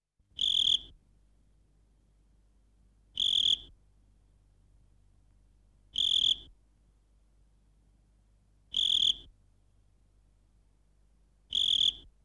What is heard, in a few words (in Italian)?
GRILLO